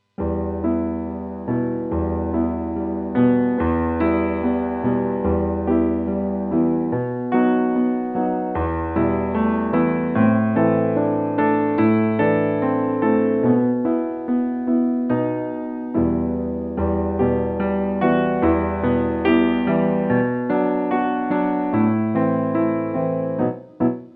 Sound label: Piano; Advent No.3 Danger